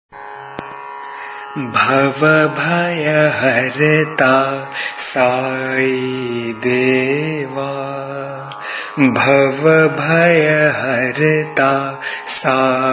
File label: Bhajan; BhavaBhayaHarata